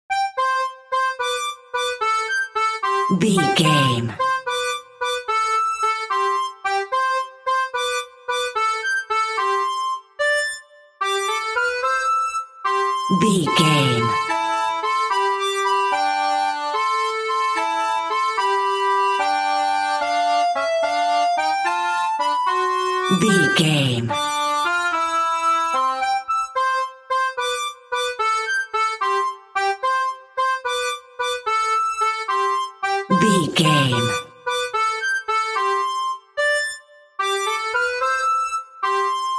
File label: accordian, Squeeze Box; Pop Goes The Weasel Squeeze Box